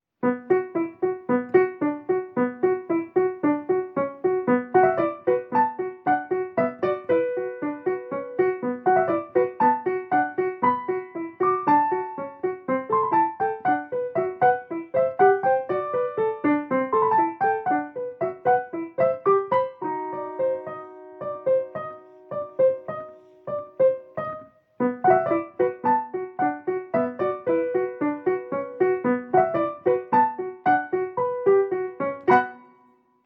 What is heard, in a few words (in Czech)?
ziva klavir; lubos sluka